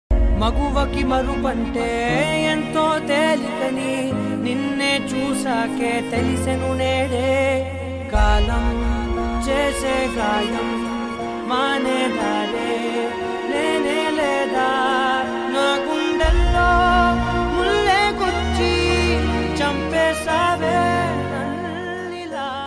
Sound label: Sad Song Ringtone; Husharu Movie